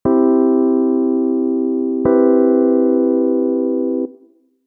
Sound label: c v cmaj7